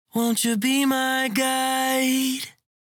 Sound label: Vocal Phrase Won't You Be My Guide 128 Bbmin Dry